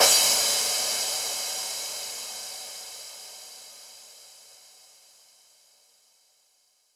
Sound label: Crash 140BPM, Drums & Perc; Vengeance Samples; Selection Kit; E minor